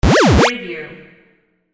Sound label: button